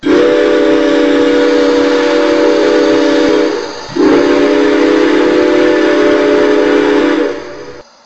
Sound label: horn